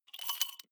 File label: flip-top bottle rattle, gastronomie; berufe